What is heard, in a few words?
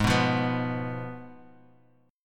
G sharp Minor 6th, Guitar Open F; strummed; 8,6,5,6,x,x; G#m6